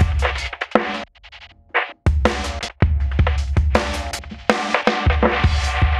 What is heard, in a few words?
Chopper Beat; Ударные и барабаны (Эмбиент); Drums; loop; Ambient; 80BPM; ambient vol. 3